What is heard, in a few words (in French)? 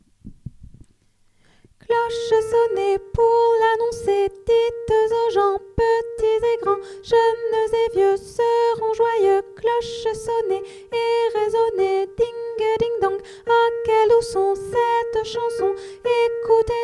cloches sonnez soprano